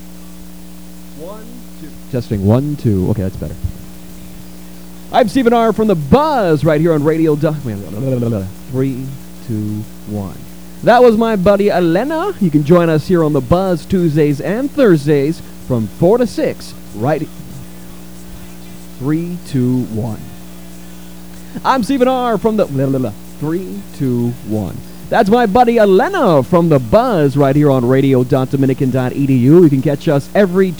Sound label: old vocals